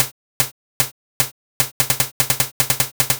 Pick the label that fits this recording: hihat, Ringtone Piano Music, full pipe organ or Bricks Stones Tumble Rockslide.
hihat